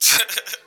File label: vox LAUGH